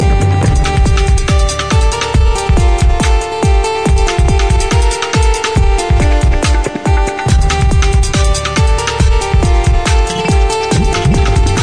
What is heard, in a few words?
airtel tabla; ringtones